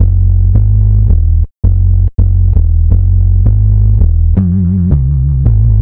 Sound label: 165bpm Dm, Bassloops; Drum Bass; Cipher; Zero-G; Total Drum Bass